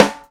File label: Hyper Snare; Airwindows